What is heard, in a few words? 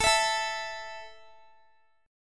A 5th A down Guitar 4E,4A,5D,5G,5C,6F, A5 Chord; strummed